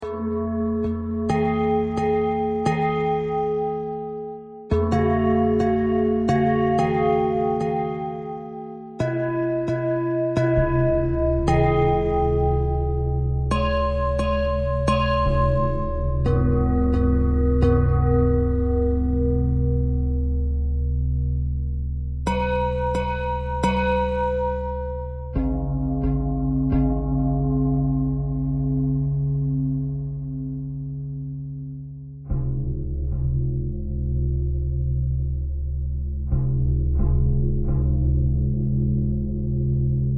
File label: bell thread pentatonic; bells